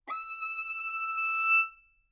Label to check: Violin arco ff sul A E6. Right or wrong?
Right